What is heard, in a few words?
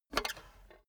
cash box metal lid open hard; maschinen; soundpool